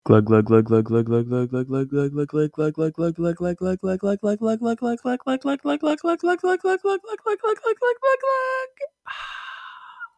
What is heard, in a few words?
Glug, milk jug; talking milk jug toy